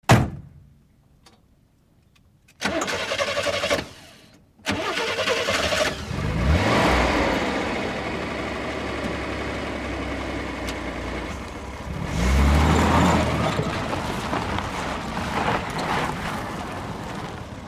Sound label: download Sound, Chevrolet Corvair, Interior Pov, Door Close, False Start, Start, Reverse Fast, Stop with Skid On Gravel, Away Fast.